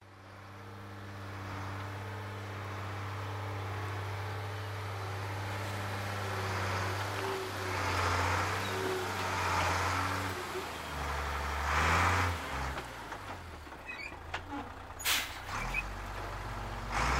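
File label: garbage truck loading garbage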